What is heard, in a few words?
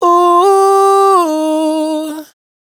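riff ooo 105 F minor, Vocals, Dry Vocals; Raw Vocals; Slate Digital Omnivox Vocal Sample Pack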